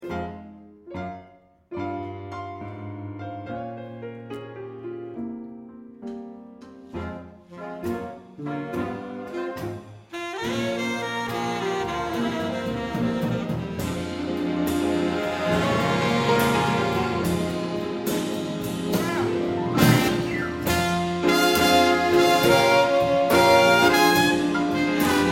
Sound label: Jazz In The Rain